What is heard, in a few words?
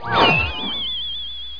Teleport; Duke Nukem